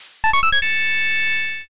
all clear

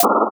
microwave open

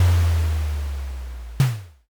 castle gate

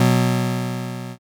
power chord

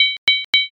coin insert

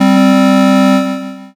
buzzer end

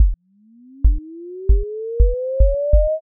pulse

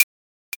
stopwatch

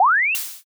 warp out

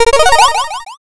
picoquestion

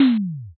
impact text

